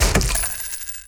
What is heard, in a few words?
Purchase Sound